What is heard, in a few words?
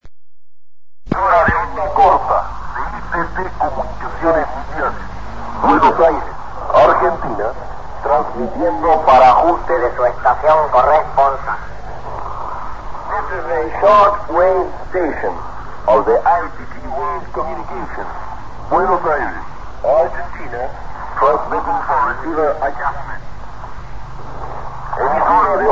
buenos aires itt; 1966